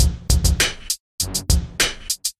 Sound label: Hip Hop Drum Loop